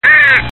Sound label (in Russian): crow odin kar; data crow